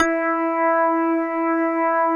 METAL B

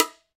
M BONGO S, World Percussion

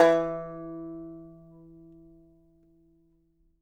banjo E3, banjo notes, country; Sampleheads - Whole Lotta Country 1 & 2

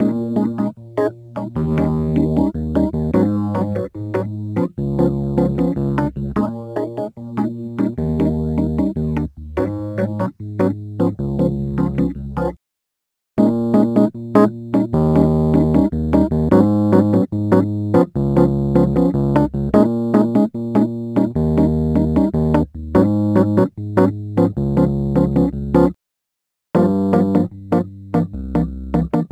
Rhodes Attacks; Ueberschall

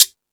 Percussion

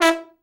trombone; Best Service; Killer Horns

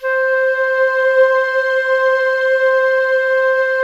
STR K-2000, STRING; Best Service Gigapack 1

